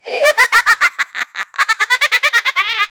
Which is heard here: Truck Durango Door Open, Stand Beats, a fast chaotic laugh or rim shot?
a fast chaotic laugh